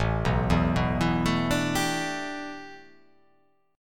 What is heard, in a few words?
A 7th Suspended 2nd Suspended 4th